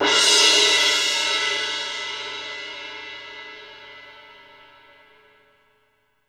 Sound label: C18 MF, orchestra hits; Best Service; Peter Siedlaczek's Advanced Orchestra